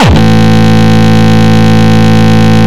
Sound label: Longkick, kicks